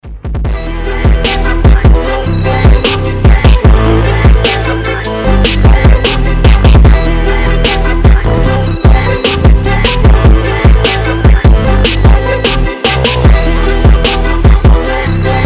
phonk

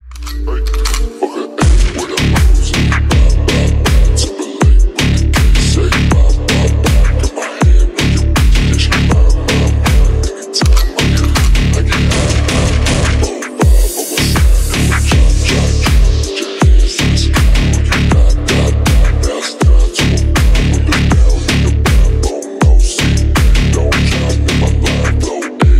remix

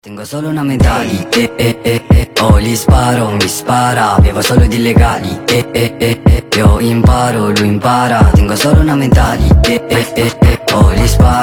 sped up version

